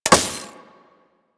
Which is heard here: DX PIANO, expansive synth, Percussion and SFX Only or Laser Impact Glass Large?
Laser Impact Glass Large